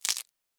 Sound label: Bone, Combat and Hunting; Survival Game Sounds - Lite Edition